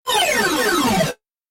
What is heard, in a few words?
Elektronikz Tone